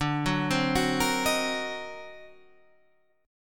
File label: D 7th Flat 9th Flat 13th; arpeggiated